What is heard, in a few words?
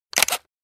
Camera shutter click taking picture